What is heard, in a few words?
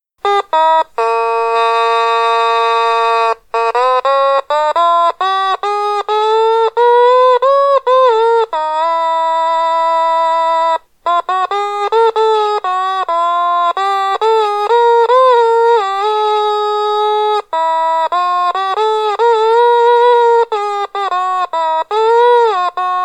loader otamatone version; farbrausch